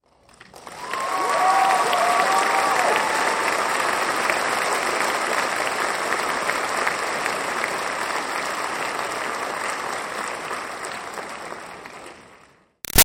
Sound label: Crowd Applause Cheers Audience Grad Med; Auditorium; Graduation; Human; Big Room Sound